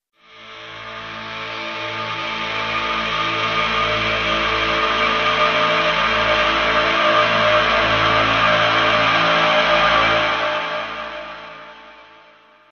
Motion Syn

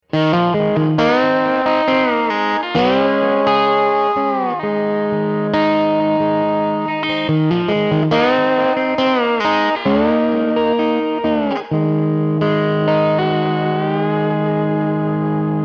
steel bends

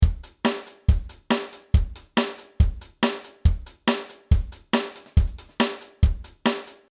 Ska 140

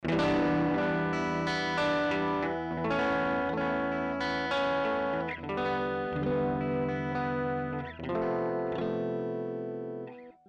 MuRF guitar

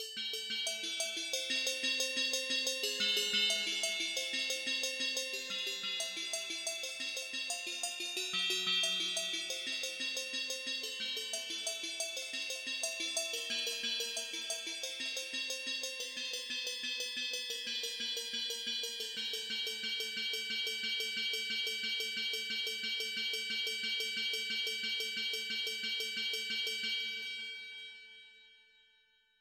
Artificial Bells

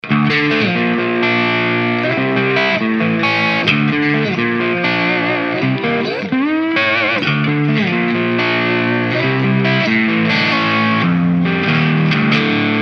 Chunky Rhythm

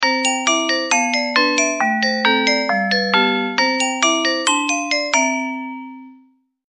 Glocken

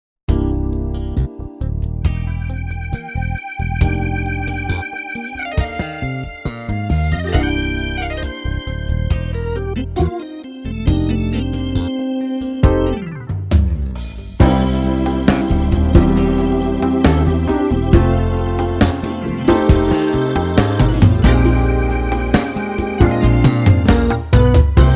Funky Ballad

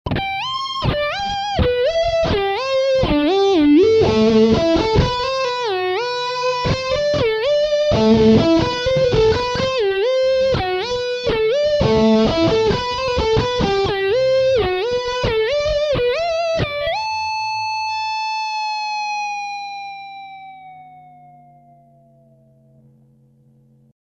BENDER

